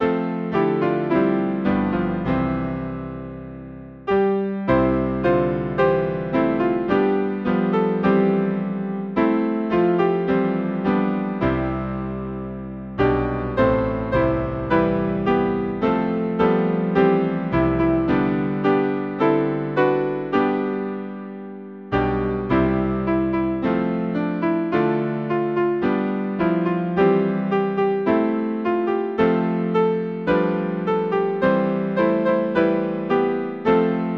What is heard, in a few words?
piano, Hymn; I sing a song of the saints of God; Grand Isle